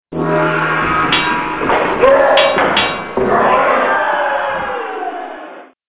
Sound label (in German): klingelton